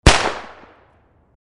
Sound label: firework rocket explode